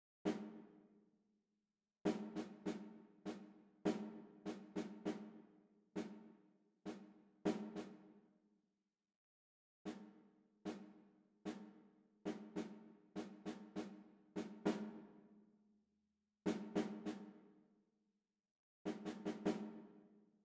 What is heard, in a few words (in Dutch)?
tentamen ritmisch, solfege